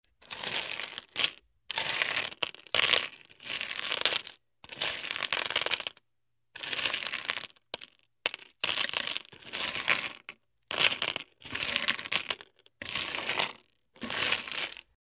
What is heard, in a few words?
Ohajiki